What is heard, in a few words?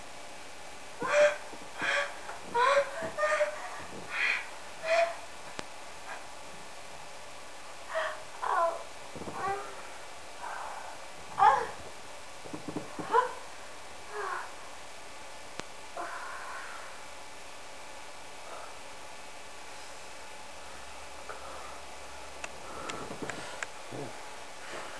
masturbation